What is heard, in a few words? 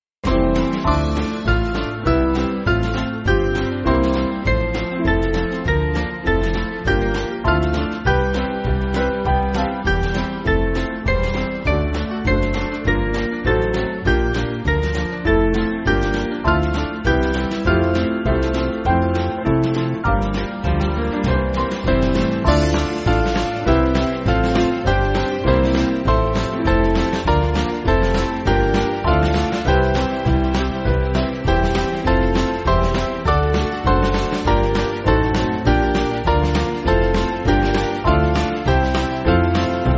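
Band, Free Music Backing Files for Churches; O Who Shall Roll; New England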